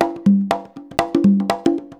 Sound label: 120 CONGAS, Percussion Loops